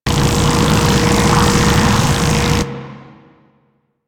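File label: Settlement Power machine Loop; Ambience; Warframe; Grineer Settlement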